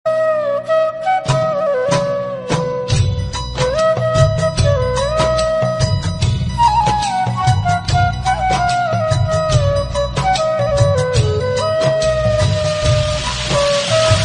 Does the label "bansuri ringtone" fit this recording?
Yes